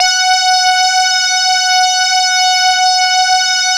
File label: SAW RAVE, LEADS